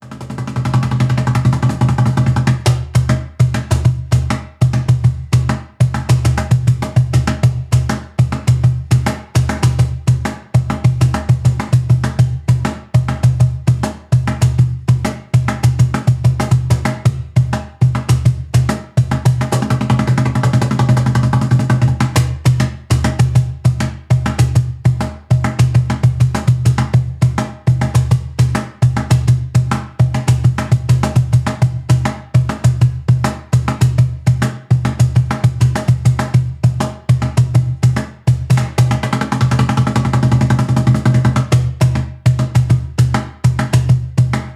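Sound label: Jungle Beat, Drums; Drumtastic vol II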